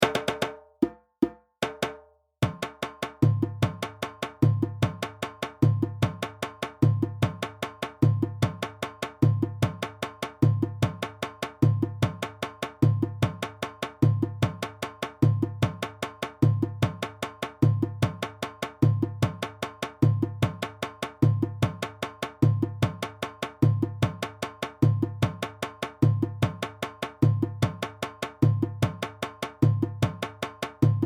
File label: Sangba + Djembe; Djembe 1; Sorsonet